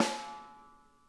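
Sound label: snare edge; Sonic Reality; Interactive Drum Kits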